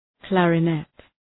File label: clarinet